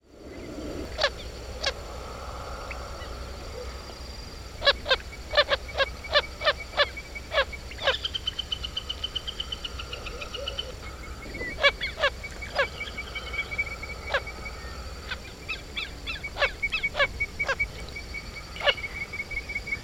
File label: wilson's phalarope